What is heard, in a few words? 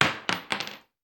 Bullet Shell; Sound Ideas; Guns